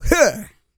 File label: GRUNT, MALE HUMAN; ILIO; Vocal Planet